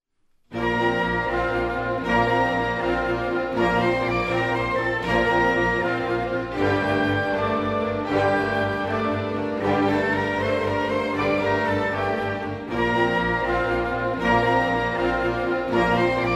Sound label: Lezginka; Fourth Way